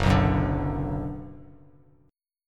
F sharp Suspended 4th Sharp 5th Bass Standard, chord; strummed; JGuitar